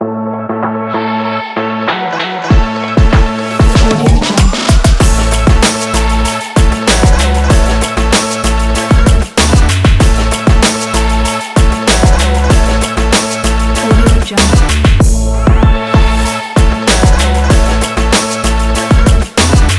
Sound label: lifestyle vlog hiphop